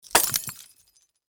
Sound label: Lightbulb break shatter explosion; torch sound effects